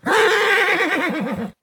prepare, horse; combat; creatures

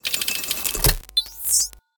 core done; activities starres

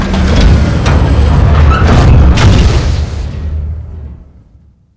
finish docking, ships; CosmicRage

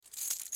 neck, wear; CosmicRage

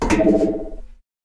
console

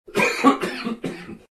cough; CosmicRage; socials

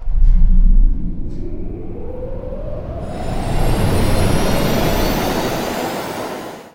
power on; ships, deep space repair; CosmicRage; CosmicRageSounds

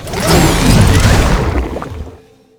solar energy

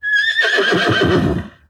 whinny; horses; CosmicRage

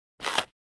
eat food, food and drink

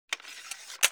combat drone install; CosmicRage; ships combat

